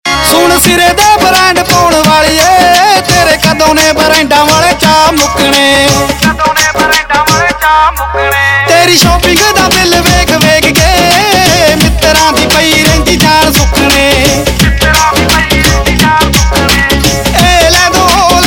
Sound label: Ringtone, Punjabi